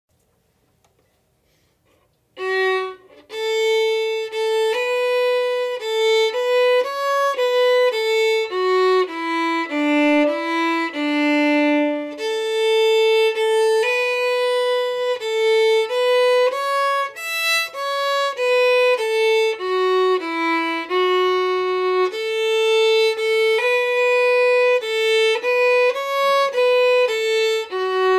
Epic Reel slow

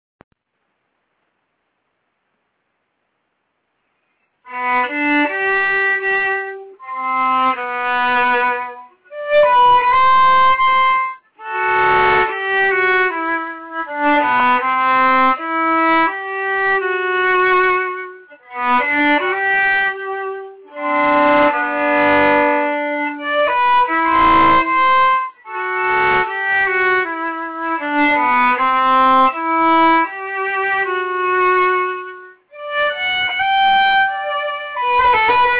Eleanor plunkett harmony

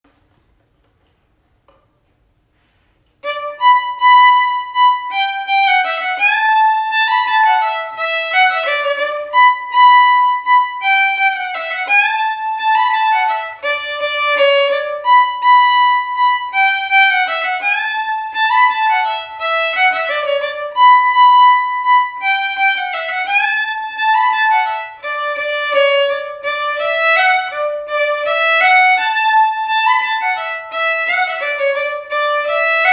The Wren upper harmony

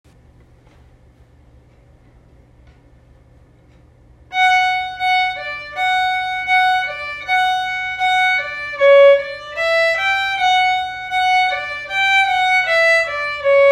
Fairy Dance

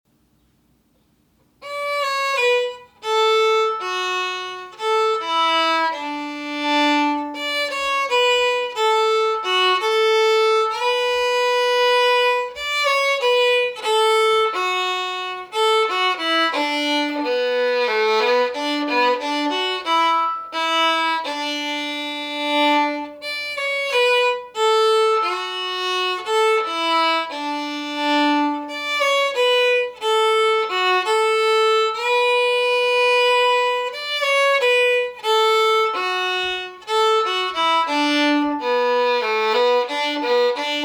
Turtle in the Grass

